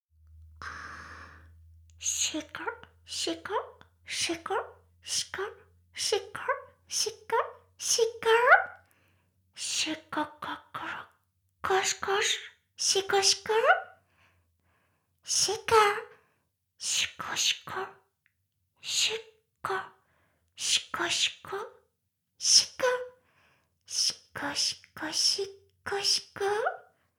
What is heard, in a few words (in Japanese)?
シコシコ音声; ASMR; 魔法少女マスカリマッスル